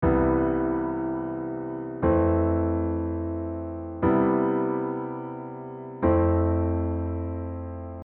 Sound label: diatonic prog